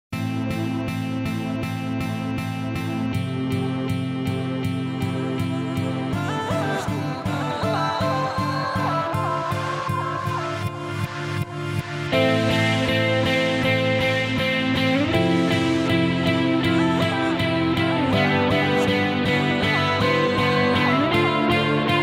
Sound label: New Instrumental Song Ringtone